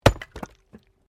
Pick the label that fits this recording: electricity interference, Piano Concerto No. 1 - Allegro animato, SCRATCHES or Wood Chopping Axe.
Wood Chopping Axe